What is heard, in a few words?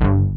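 big poly bass; Pro-Rec - Synthsonic; TRINITY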